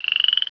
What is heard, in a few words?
FROG SNAP